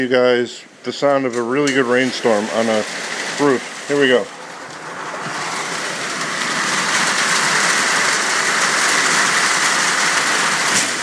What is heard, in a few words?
rain